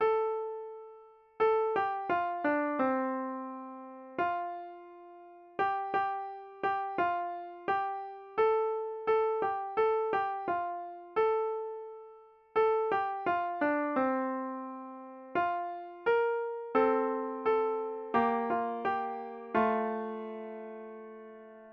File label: go tell it on the mountain beginner piano